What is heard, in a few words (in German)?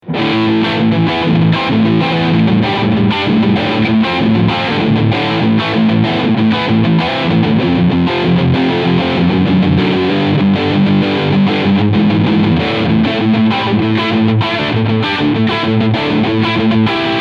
Harley Benton Fusion T HH Riff Sound Steg Pickup